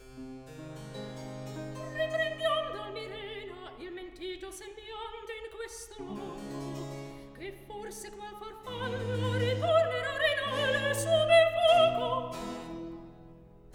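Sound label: Recitativo, Handel opera; Act 2; Riprendiam d'Almirena; Handel - Rinaldo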